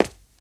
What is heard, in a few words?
run tile; lucius